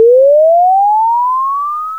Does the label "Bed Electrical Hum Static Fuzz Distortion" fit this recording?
No